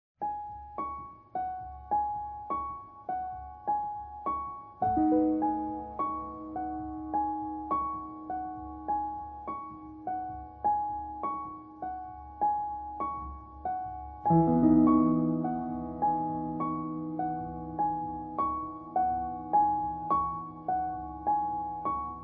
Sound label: Relaxing Piano cover; Animal Crossing： New Leaf